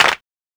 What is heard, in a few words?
00s hand clap sample a sharp key; percussion